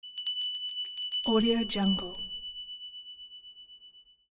handbell ringing; greenwaysound

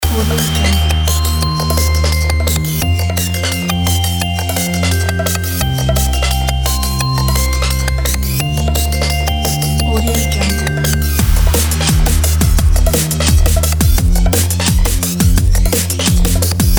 energetic atmospheric futuristic dnb

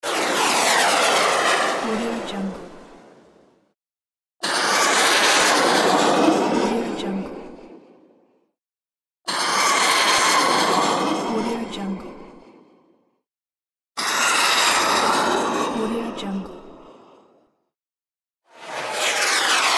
missile rocket launcher sound effects; soundall

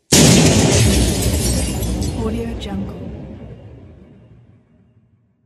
double explosion

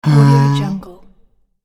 giant ogre troll

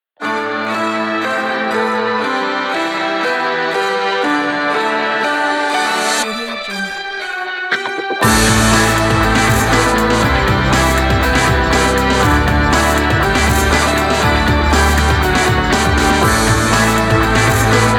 epic sport energy

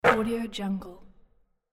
net swoosh

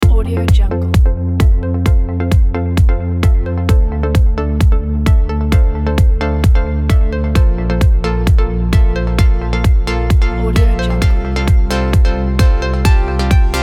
uplifiting trance background

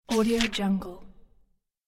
flipping page

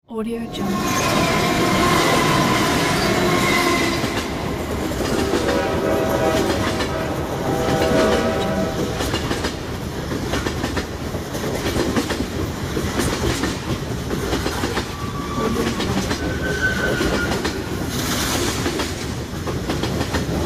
train ambience

